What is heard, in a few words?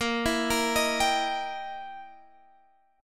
E Minor Major 7th Flat 5th, Bb